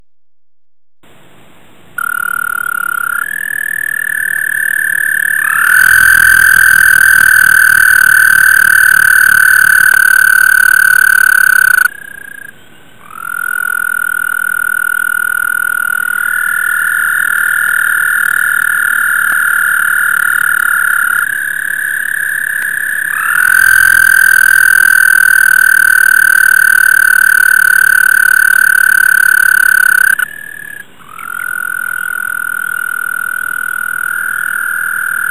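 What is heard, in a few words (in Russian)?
жаба американская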